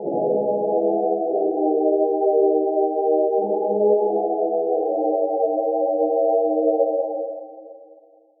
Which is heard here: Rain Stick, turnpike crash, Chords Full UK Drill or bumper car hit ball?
Chords Full UK Drill